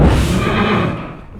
reality rift cast; weapons; dota; chaos knight

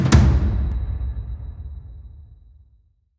drum strike; weapons; dota; beastmaster

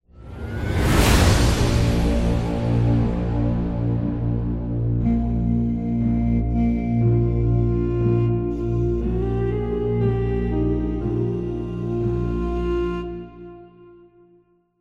lose; stingers

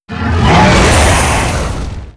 macropyre cast; dota; jakiro

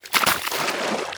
arc gore; weapons; dota; pudge

